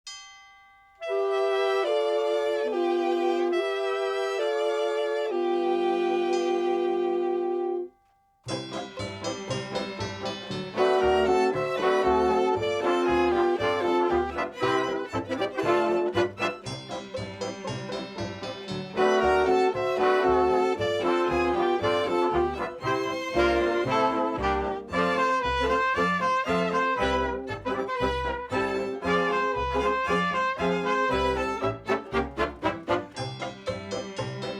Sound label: bells